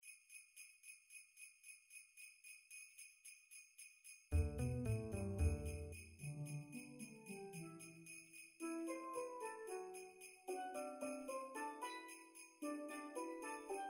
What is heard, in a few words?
Jingle Bells Steel Drums; For Steel Drums; McCafferty Percussion